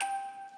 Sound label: musicbox